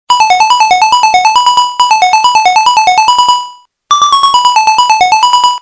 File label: X1